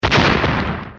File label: effect thunder, battle